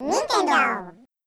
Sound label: Logo; Nintendo; Yoshi s Story Version